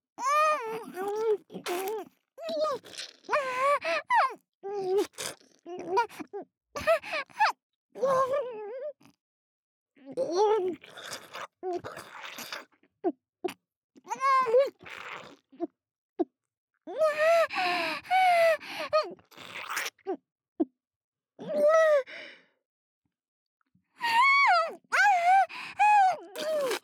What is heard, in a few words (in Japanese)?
口内射精 ＋ 精液ごっくん ループ; ループトラック